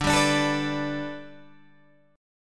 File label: G Major Add 9th Guitar Standard, chord; Gadd9/D strummed; JGuitar